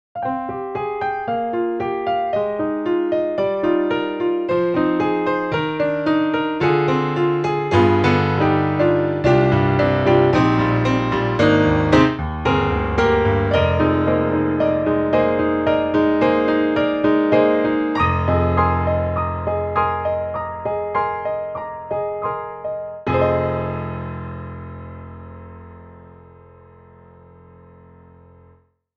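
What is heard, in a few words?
Yamaha C7, piano; SoundFont2; Equinox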